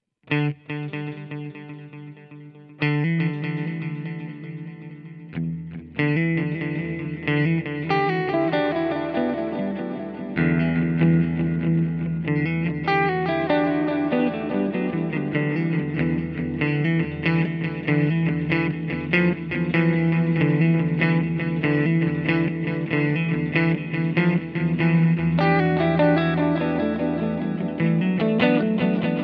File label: GFI System Orca Delay Ambient 5th